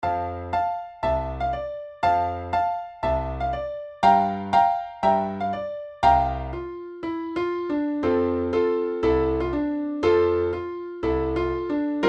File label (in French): accompagnement piano